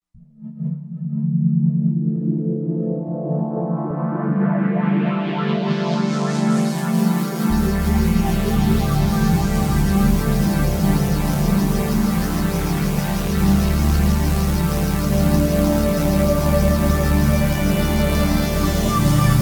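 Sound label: pad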